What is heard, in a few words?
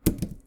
Smudge drop; Phasmophobia